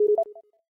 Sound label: Unlock, phone ui